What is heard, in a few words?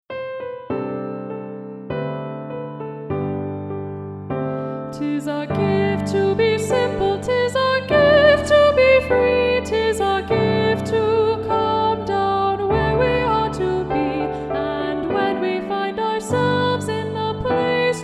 all altos, g major; simple gifts